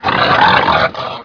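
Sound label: die, alien controller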